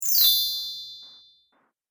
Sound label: High Chimes Down; Chime Sound Effects; multimedia